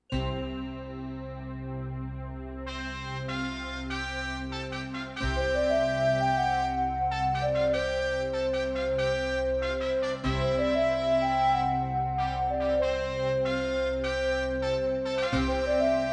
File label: AWE64 Gold; Space Quest 3 Introduction